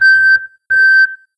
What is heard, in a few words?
Reverse Beep; Transport-Road